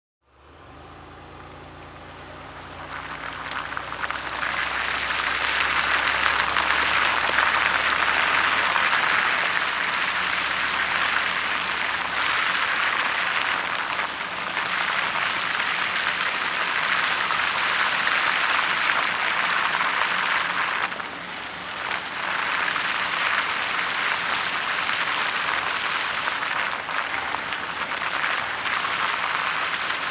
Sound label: Car driving slowly on wet dirt